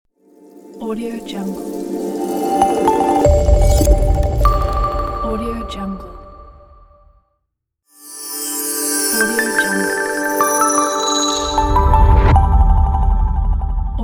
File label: technology logo reveal pack; kirillluzin